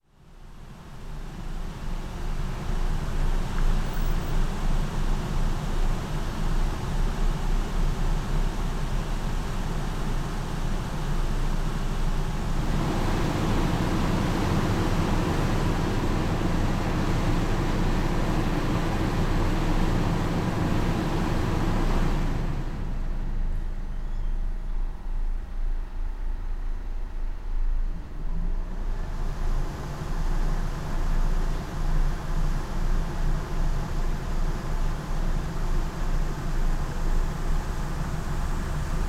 supermarket air cond, sergiyev posad

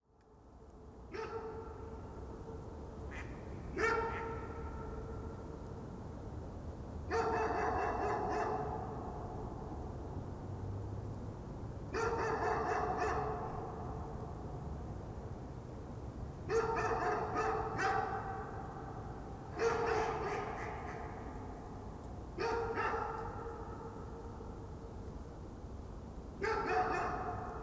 zagorskoye more at night

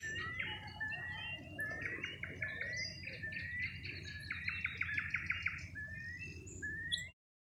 possible falco peregrinus; pustoe rozhdestvo; dmitry zimin

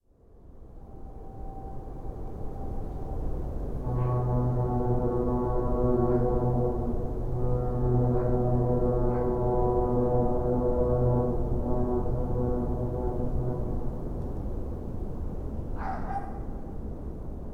snow; sergiyevposad

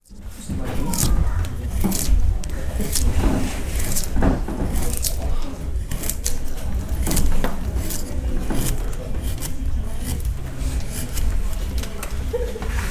woodcarving bogorodskoye

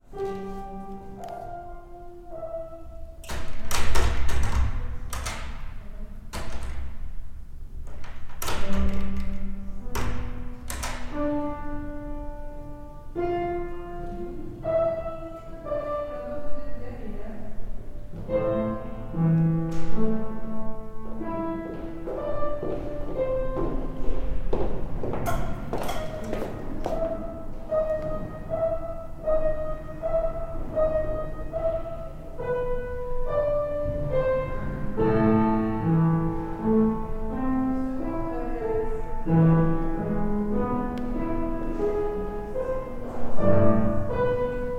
posad piano class

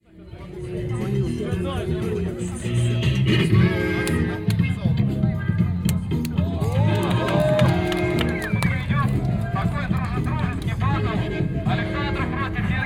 breakdance; sergiyev posad

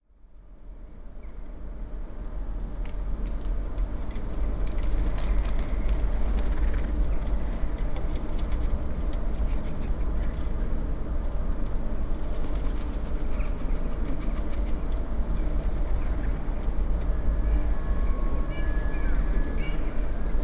pigeons, Sergiyev Posad

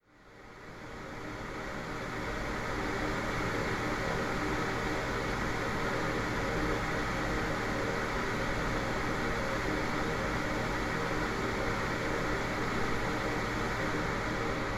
theatre after; teatralny kovcheg theatre; sergiyev posad

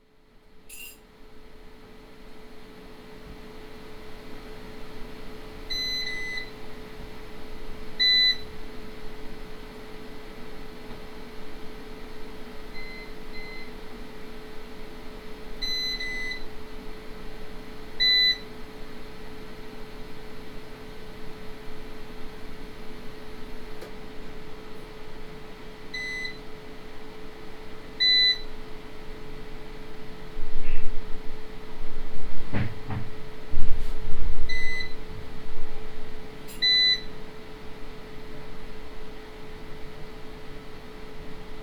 power cut; sergiyev posad

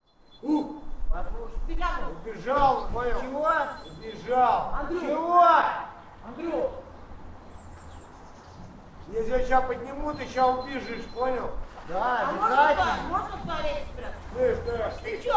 street brawl; sergiyev posad

